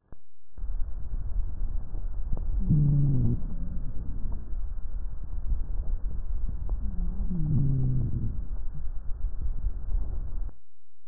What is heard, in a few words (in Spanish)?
roncus adulto